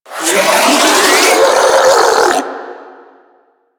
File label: Melee Mid Spin Scream; Warframe; Infested; Ancient